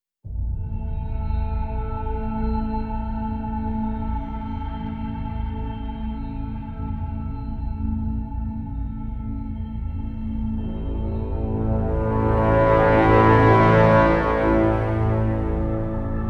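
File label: no percussion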